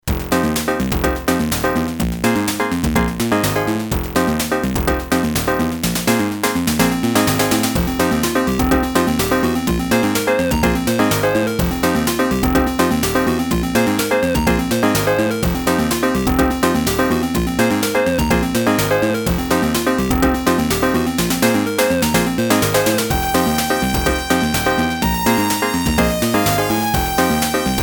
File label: Violent Breathing (PC OPL2); Streets of Rage